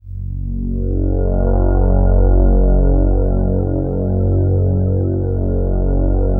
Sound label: DENSE FL, PADS; Masterbits; Vintage Voltage